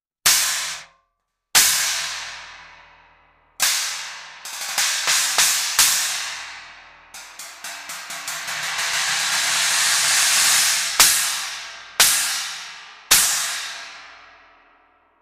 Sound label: Voodoo 18 Inch Thin China